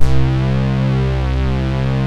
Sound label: POLY PAD SYN; Pro-Rec - Synthsonic; Z1 PADS